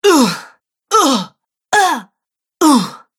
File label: Female Fighter Grunts Collection